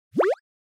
Anime Motion Low

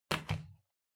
Calendar Hang

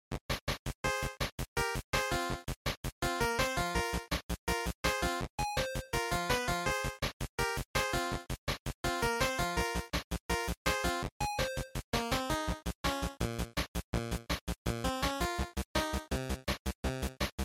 GB Fighting Match

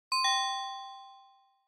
Quiz Ding Dong Single

